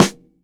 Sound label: Rim, drum